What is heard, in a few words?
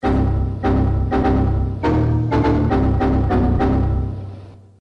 Winxp Death Tone